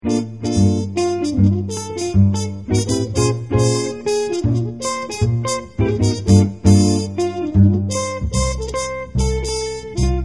trompete

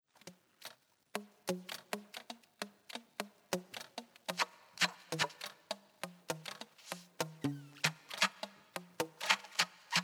saxophon

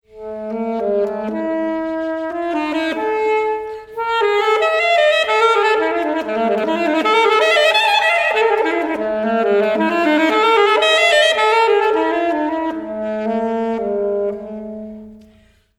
saxophon

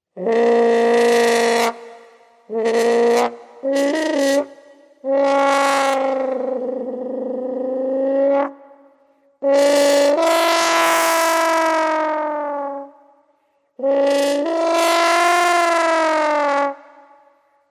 posaune

